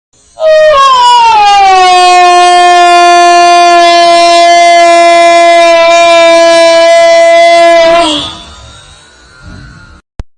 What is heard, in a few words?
uooooooooooooooh